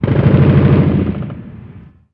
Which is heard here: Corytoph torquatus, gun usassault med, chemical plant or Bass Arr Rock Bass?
gun usassault med